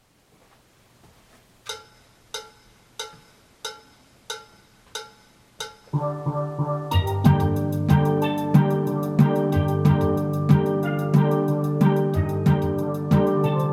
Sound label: guitar solo tenors; bella-ciao